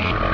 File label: med shot no; Source Engine; bshift